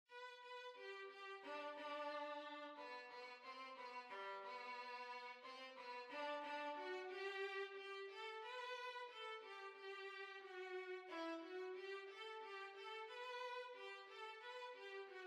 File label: Violin